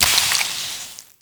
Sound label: Wet Paint; Levels Hub Orleans 01 Draw Wallpaint; Wet Paint Brush